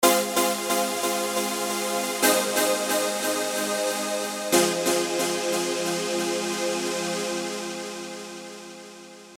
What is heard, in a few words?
noiser Key Pad